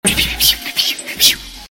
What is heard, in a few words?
pew